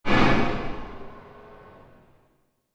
Metal Impact SciFi Horror, Metal Slam Cell Door Deep Metallic Impact Reverb; Hits (Sound Effects:Horror); Horror Scifi Science Fiction